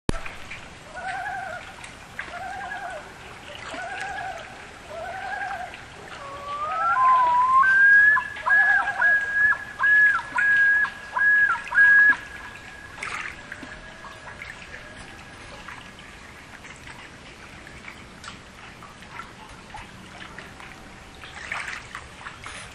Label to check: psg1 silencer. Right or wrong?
Wrong